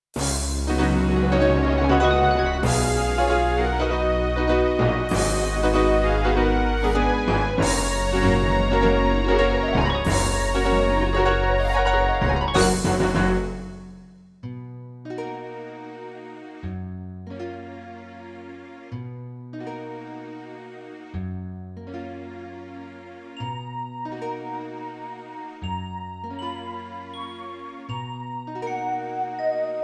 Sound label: CM500 GS; Larry 3 passionate patti in pursuit of the pulsating pectorals